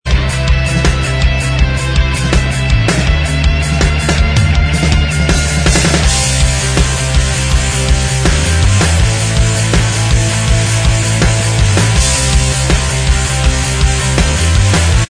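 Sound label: instrumental, backing tracks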